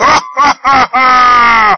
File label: ar mahahaha